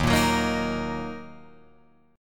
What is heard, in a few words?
Eb Minor Eb down Guitar Open F; Ebm strummed; JGuitar